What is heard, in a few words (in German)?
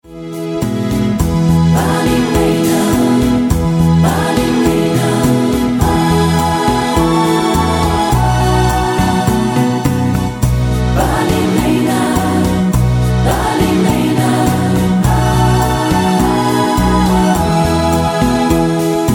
mit Chor